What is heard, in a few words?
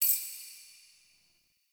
Tablet Rollover; Warframe; Duviri; Easter Eggs